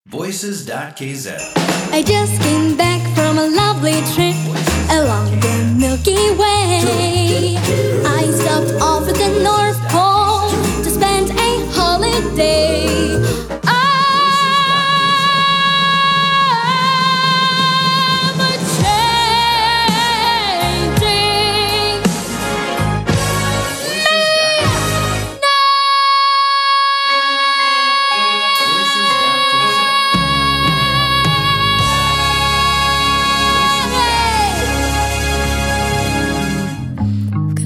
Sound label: vocal